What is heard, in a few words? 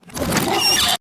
Chest Open, Furniture; Daz3D